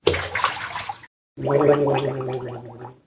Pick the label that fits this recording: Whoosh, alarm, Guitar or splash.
splash